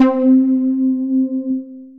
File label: Steel of Drum, Synth Lead; Ableton; Factory Packs; Synth Essentials